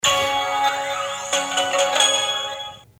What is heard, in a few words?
Nuc Box Sound Intel